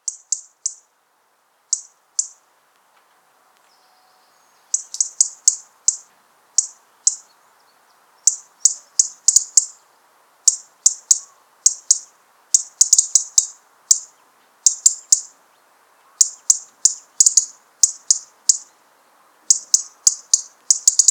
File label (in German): Rotkehlchen Alarm